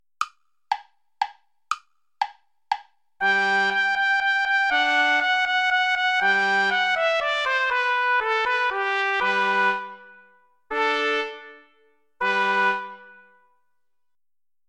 old hungarian dance play along without trumpet

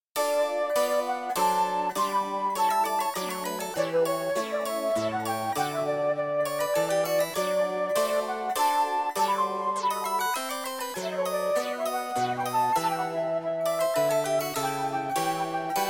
sonata minor for flute and harpsichord

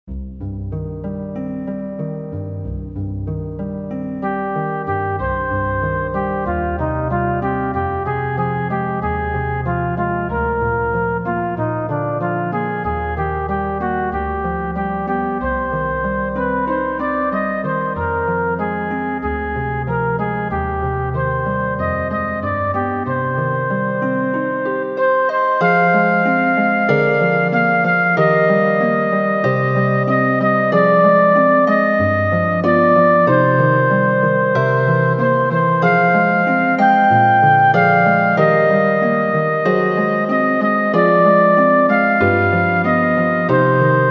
minor version; version mineur

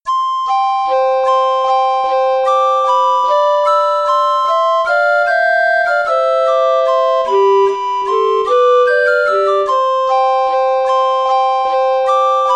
the chimes; christmas eve

